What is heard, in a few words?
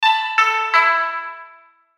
Logo Koto Melody; Sonic Logo Sound Effects; Production Element Imaging Element Accent Transition